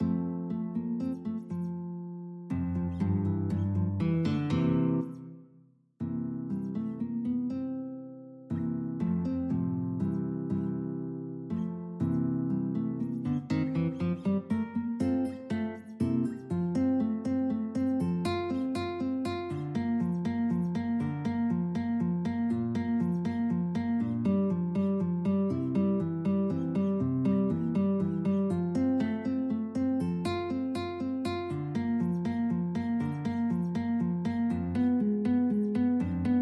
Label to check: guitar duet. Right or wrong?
Right